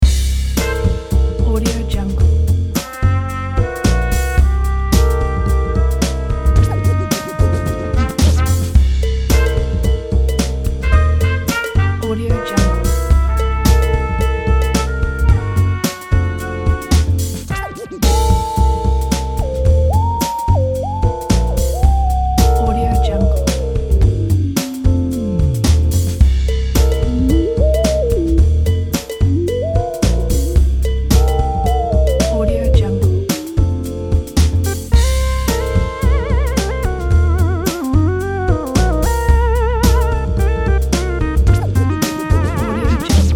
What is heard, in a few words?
acid jazz synthy groove